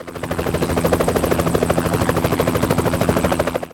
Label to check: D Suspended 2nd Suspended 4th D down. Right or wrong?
Wrong